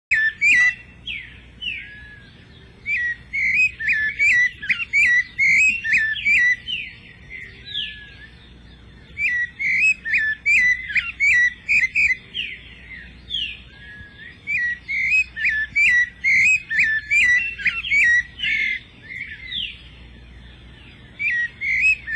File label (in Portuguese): laranjeira fundo trinca-ferro, cantos sabias; dalgas